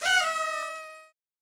party noob horn

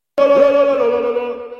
fahh lololo

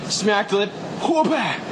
smack the lip

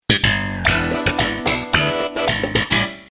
sitcom transition bass riff; seinfeld

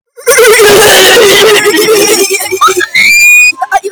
hehehehehaha

